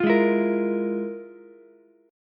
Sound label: F Minor Major 11th, Ukulele; strummed; JGuitar